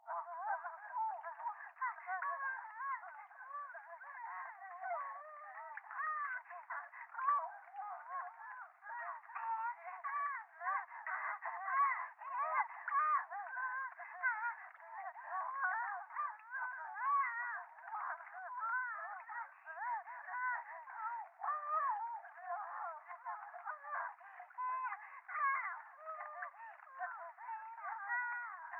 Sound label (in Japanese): 電話 低音喘ぎ 雨上がり, ASMR